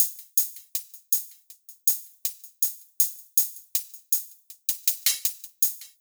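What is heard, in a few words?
Down-Home, ambient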